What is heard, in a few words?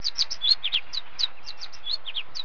Finch